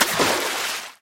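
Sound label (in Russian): одиночный короткий всплеск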